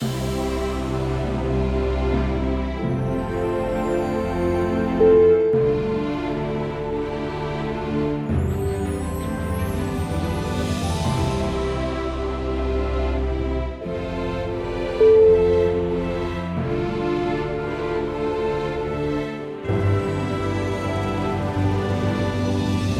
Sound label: imperial grand royal opening